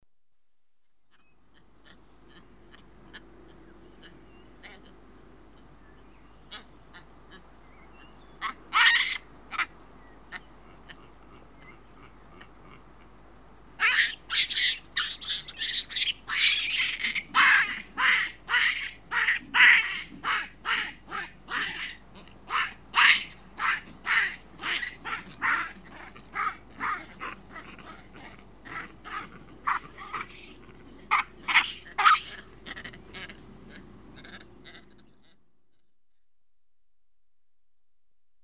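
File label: Juvenile screaming, with background, Africa The Natural World; The BBC Sound Effects Library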